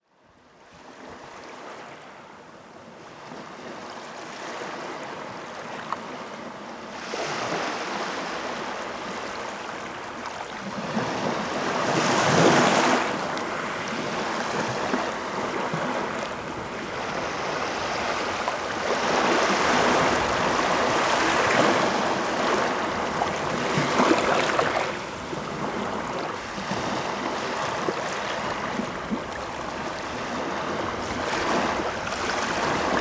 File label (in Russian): na morskom beregu